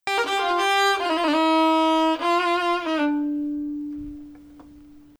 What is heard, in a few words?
Bayati, Maqam Bayati; Bayati 1